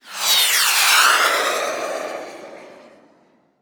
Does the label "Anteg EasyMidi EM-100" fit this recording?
No